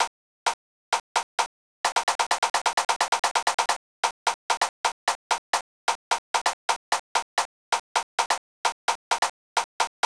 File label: Drum Salute Tenor Drum; drummers music library